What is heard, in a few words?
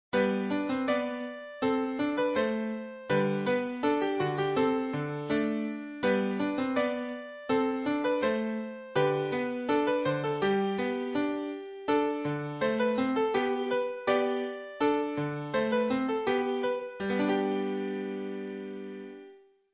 Lullaby Wiegenlied BABY